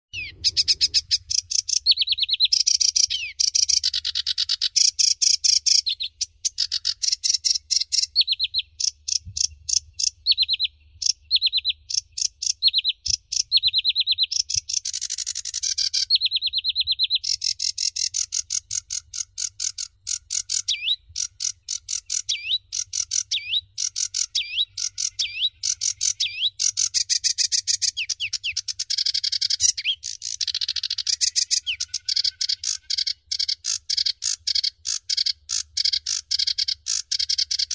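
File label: sedge warbler, Acrocephalus schoenobaenus; kamishovka barsuchok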